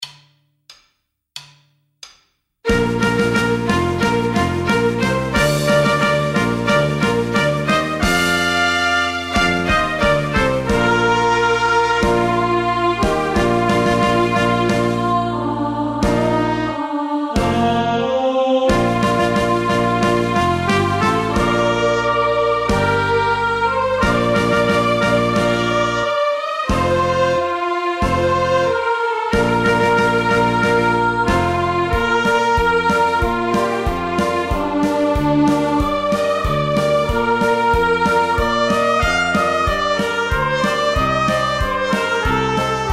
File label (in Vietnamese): Hoa Tau